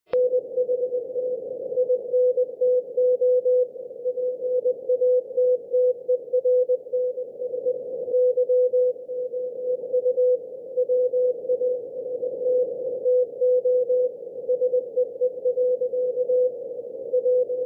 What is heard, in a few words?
HAARP CW 9100kHz